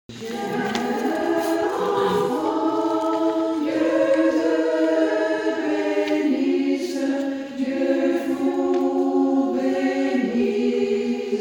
Sopraan Alt; Le adieu des Bergers

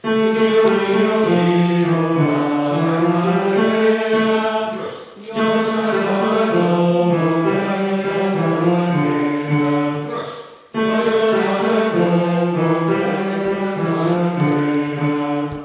Riu Riu Chiu Bas

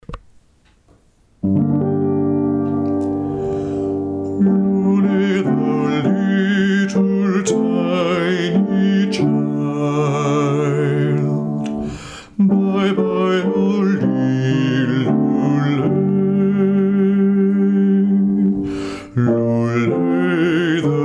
Coventry Carol bas

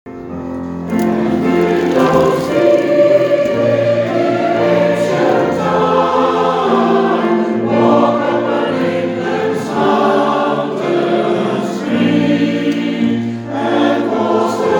Jerusalem Tutti midden

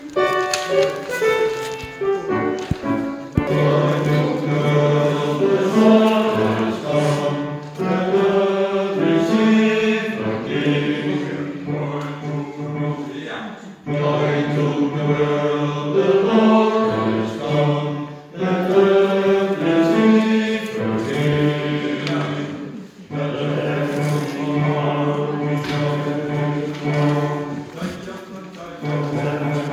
Tenor Bas; Joy to the World

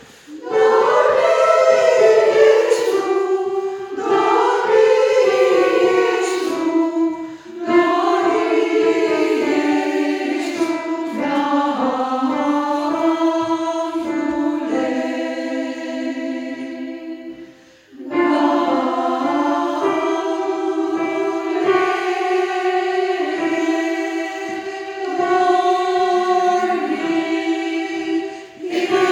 Dormi Jesu Alt 1 en 2

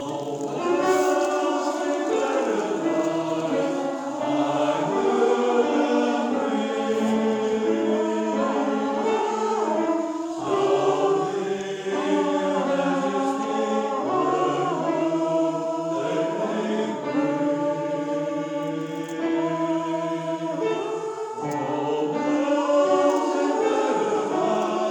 Alt Bas Ah

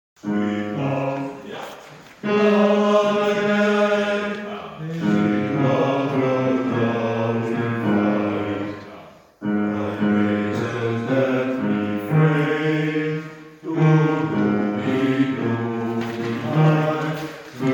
Bas; Come Again

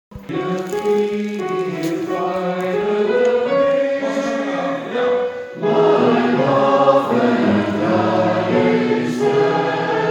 The Sally Gardens Sopraan Bas

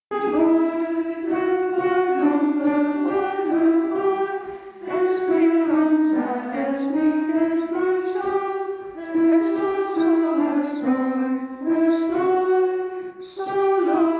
Refrein Alten

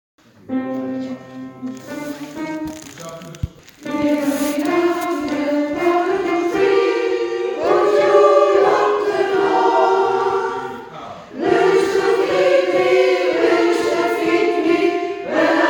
Sopraan Alt

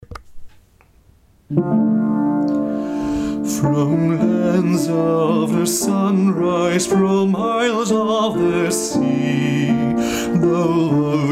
Love Knows No Borders sopraan

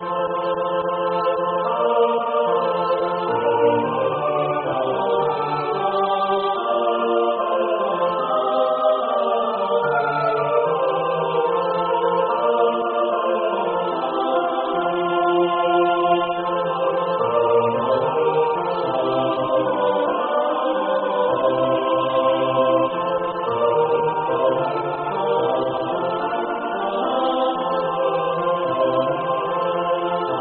SATB; Though Philomela Lost Her Love

